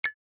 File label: Futuristic click